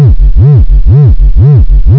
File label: Spin Line; SketchBook 2.1